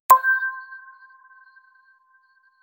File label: ui button sound